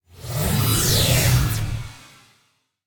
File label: activate; smite; heimdallr